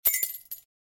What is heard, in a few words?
payment